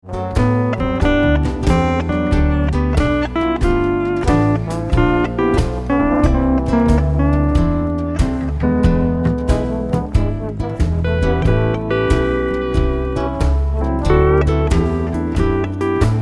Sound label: Blues; Dixie